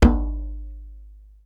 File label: Djembe; EMU Orbit-3